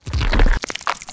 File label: blast